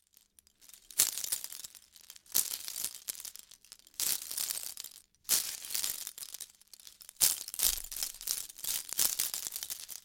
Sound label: Seashell necklace jewelry movement